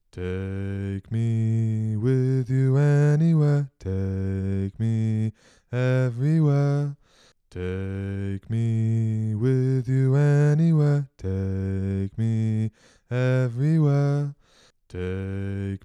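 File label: Bass, community choir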